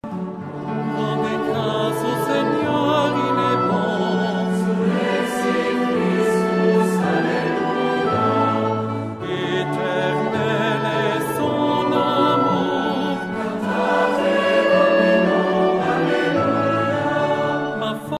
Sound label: surrexit christus solista